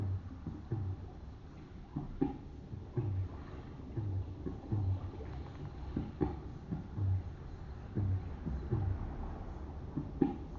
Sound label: Forest Flute Incantation